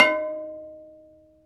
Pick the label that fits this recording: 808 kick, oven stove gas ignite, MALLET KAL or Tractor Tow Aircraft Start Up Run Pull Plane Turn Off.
MALLET KAL